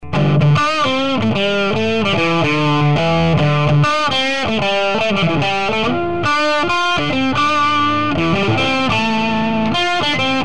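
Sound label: blues, Celestion Rocket50, Sovtek MIG-60; Сравнение динамиков от Celestion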